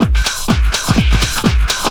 drm 125, drumloops distortmix; house; PS-28 Vocal House Samples

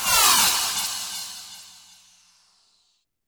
FX Downlifter

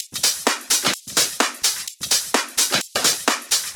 Minimal Loops

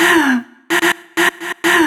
House Loops, Vocal Loops House 128BPM

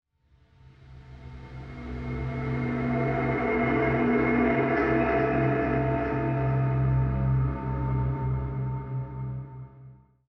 Pad; Dubstep Sample Pack

